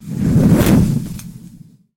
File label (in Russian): toplivo vosplamenyaetsya hlop; zvuki kokteylya molotova